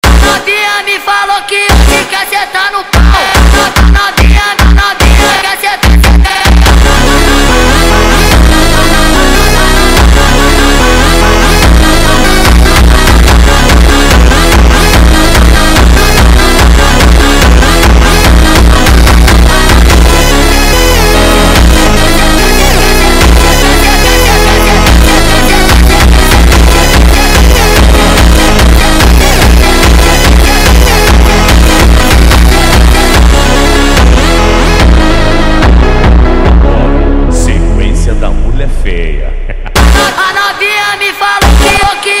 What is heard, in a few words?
Phonk